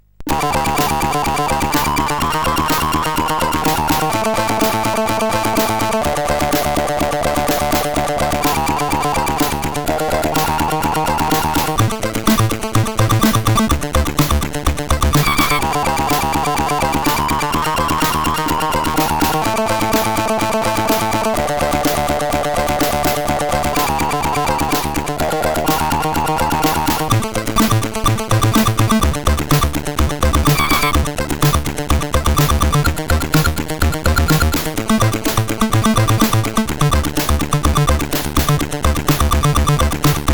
Hires Demo Kit, CSG8580R5; hvsc

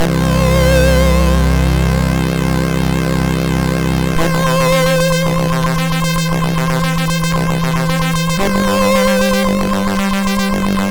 E-Load loader, MOS6581R4; hvsc

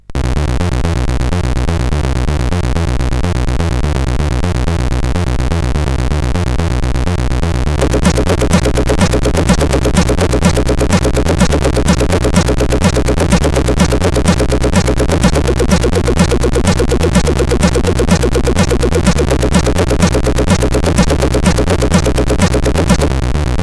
Techbeat sid CSG8580R5

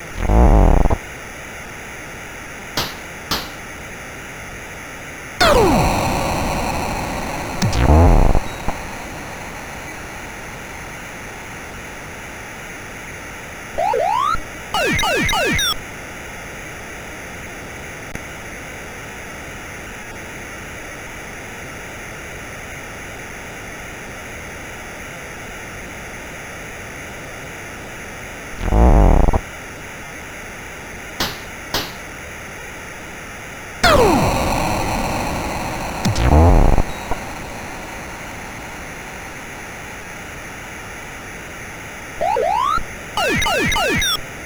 Big Funk plus FX, CSG8580R5

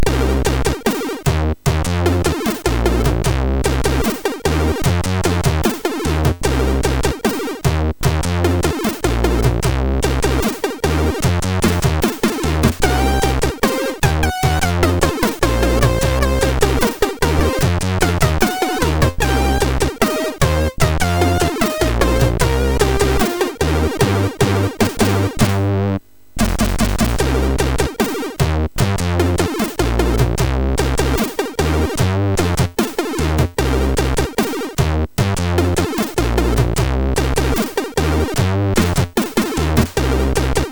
jazz tune, MOS6581R3

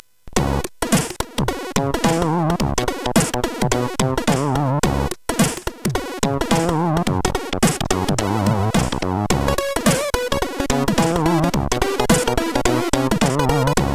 JAZZZZZ, CSG8580R5; hvsc

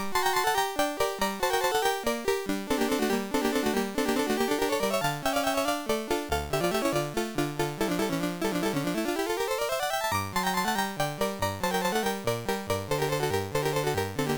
Scherzo, MOS6581; MOS6581R3

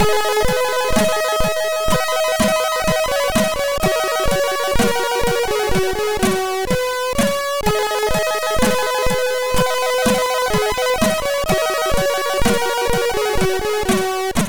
music, sid MOS6581R3; Megahertz 01 music 1

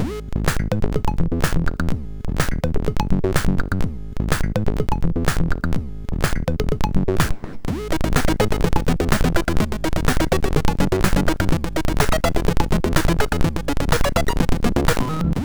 sid, MOS6581

